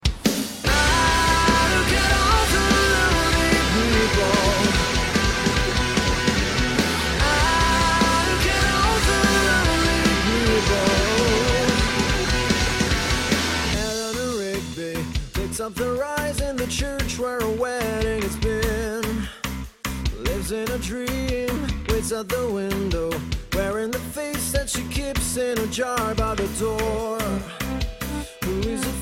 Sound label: classic tune, ringtone